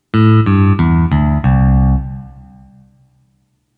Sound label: melody recognition